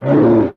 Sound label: BOSS Hurt